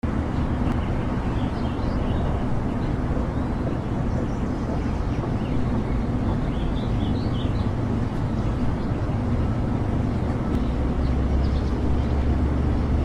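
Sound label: atmo residential, Solvang, California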